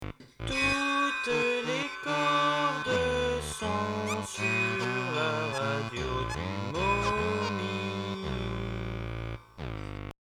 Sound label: jingle